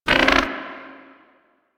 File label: Bird Three Chuff; Warframe; Ambience; Entrati